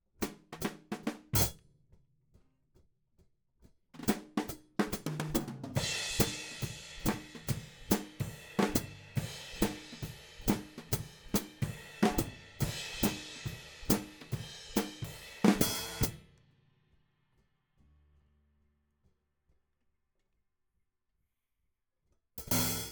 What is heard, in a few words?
Hat